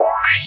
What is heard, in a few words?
E stab; Loopmasters; Funky Underground Vol 2